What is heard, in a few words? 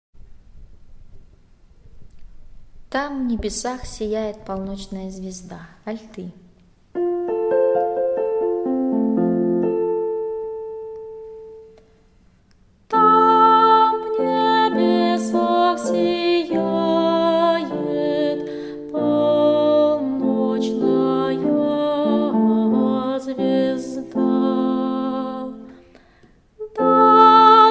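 alto; tam v nebesax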